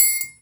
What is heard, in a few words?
triangle; InVision Interactive